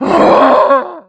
fast zombie die

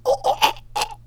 choke

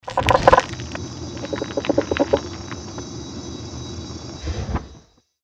mirelurk idle; magmalurk; npc

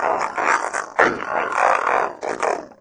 chatter, revanent; doom3

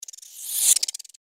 giant rat hiss; npc

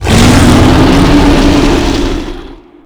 shout long

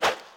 throw, tnt

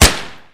aug, weapons; Optic Sight; Silenced; F6 Modular; Fallout's animations

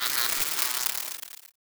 hornet attack flap wing strong

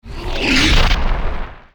magic atom before

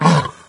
pain, doom3 pinky

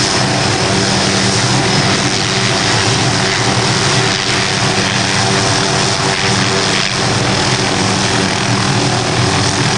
heli loop ext; wac

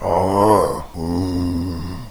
zombie idle; doom3

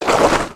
drag

